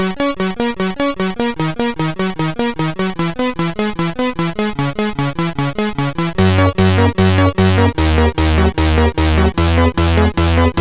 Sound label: CSG8580; Square Scape 2